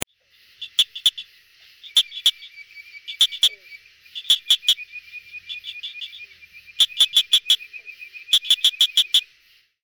Acris gryllus; voice

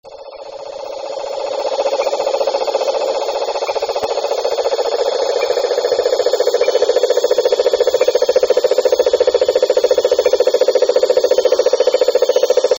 bufo marinus; voice

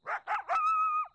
Canis latrans; voice

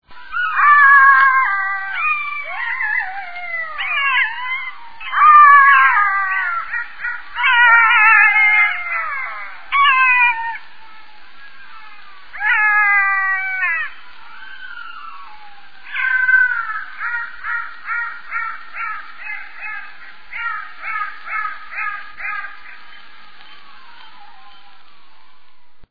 Canis mesomelas; voice

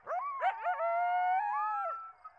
Canis latrans; voice

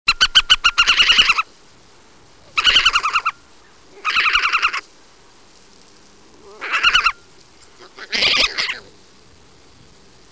Meles meles; voice

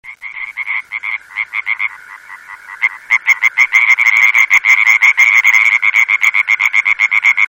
osteopilus septentrionalis; voice

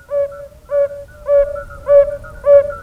Bombina variegata; voice

